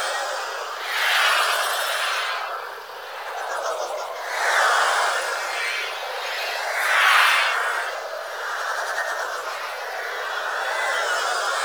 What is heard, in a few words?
Proj Loop; Warframe; Noctua